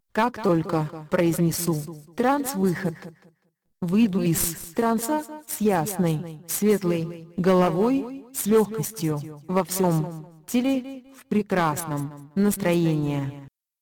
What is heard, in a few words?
trance